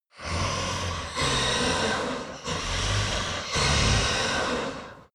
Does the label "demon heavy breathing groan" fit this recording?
Yes